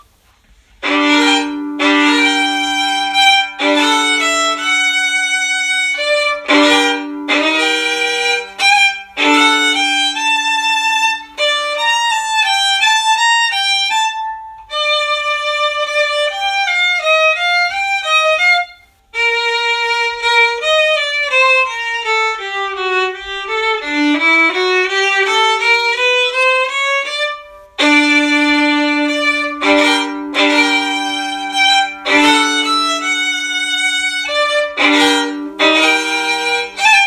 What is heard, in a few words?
Sarabande Section; christmas concert practice clips